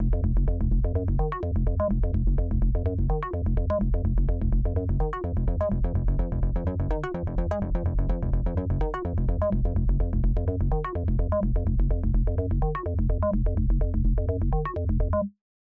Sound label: Sub Bass; Absynth 6